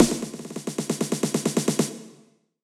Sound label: Techno Snare Roll